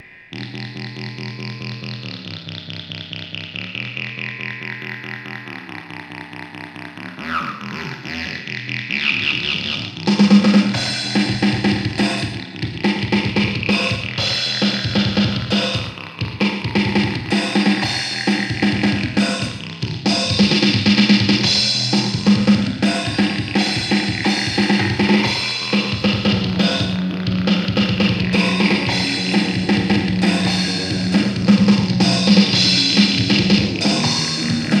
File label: the sea guitar